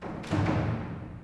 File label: people; Mafie 1